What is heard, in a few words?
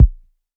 kick, dr55